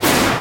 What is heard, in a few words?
Crash; 某科学的超电磁炮; flanda